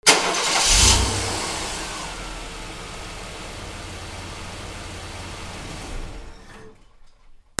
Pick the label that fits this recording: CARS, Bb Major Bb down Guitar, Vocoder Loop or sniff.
CARS